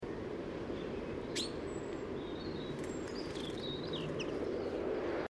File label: Fringilla coelebs; SETE